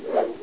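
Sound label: book open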